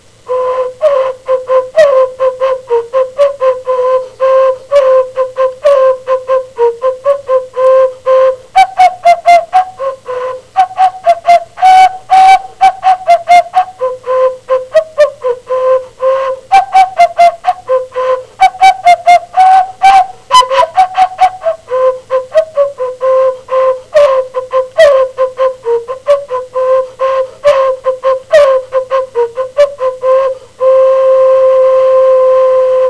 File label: flute